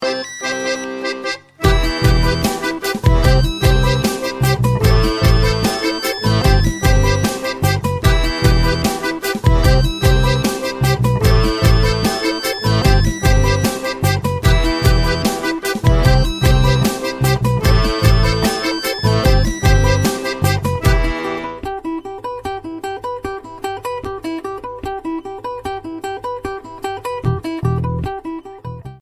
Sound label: samsung remix ringtone